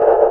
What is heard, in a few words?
trance; Synth